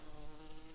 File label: Lapidarius queens, bumblebees